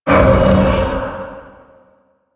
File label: Survival Spirit Impact; Warframe; Zariman